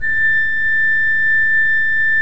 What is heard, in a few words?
A5, Cathedral Organ, KOPPELFL; Propeller Island